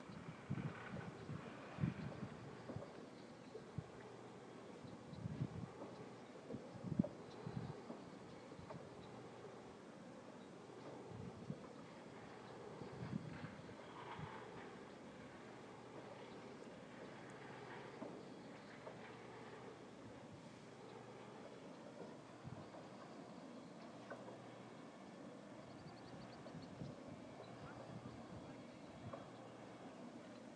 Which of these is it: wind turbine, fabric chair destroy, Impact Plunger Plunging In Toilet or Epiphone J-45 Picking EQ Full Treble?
wind turbine